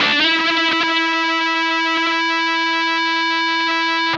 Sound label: OVER WAH, Funk Guitar; 90 s Sample CDs; Zero G